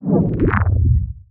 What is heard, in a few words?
Sci-fi Transition